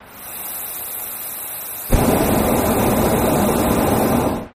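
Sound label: Hot Air Bllon Burn Ln, Hot Air Balloon Burner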